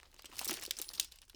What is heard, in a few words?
Commando Belt Rattle; Foley; Commando walking elements